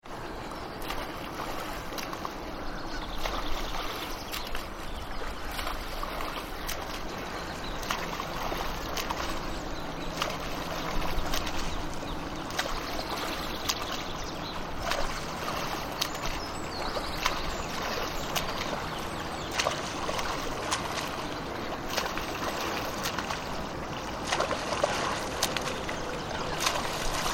Swimming In Lake, People swimming in an open lake with outdoor ambiance and water birds; Pools; Backgrounds